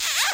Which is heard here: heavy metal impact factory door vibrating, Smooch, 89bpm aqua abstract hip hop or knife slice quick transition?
Smooch